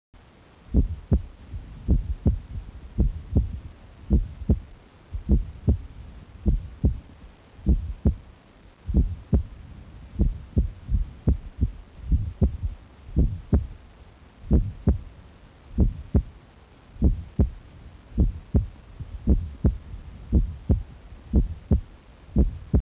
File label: heartbeat